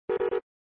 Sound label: System Abort Beep